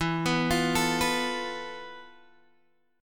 F Major 7th Flat 5th E, guitar chord

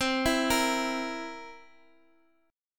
C 7th C, Guitar Open D, chord

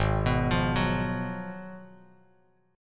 Ab Minor 13th, Bass Standard; Abm13 arpeggiated; JGuitar

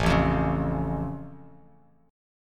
A Augmented A down Bass Standard; strummed; JGuitar

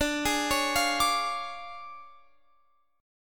D Minor Major 7th Flat 5th, D, Guitar Open F; DmM7b5; arpeggiated

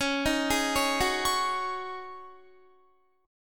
Db Suspended 2nd Suspended 4th Db Guitar; arpeggiated

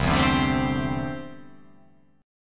C 6th Add 9th C down

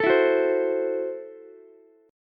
F sharp Minor 7th Flat 5th F sharp Ukulele; strummed; JGuitar

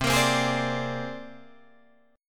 C 7th Suspended 2nd Sharp 5th C Guitar Drop D

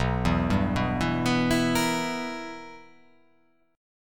B Major 7th Suspended 4th Sharp 5th B

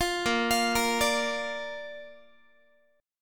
A sharp Major, Guitar; arpeggiated; tuning 4A,4E,5A,5D,5G#,6C#

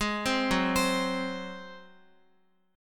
F sharp Suspended 2nd Flat 5th, Guitar; F#sus2b5 arpeggiated; x,x,8,5,6,8; 4F,4A,5C,5G,5C,6E; chord shape

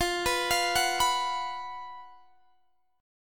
Gb Major 7th Suspended 4th F, guitar

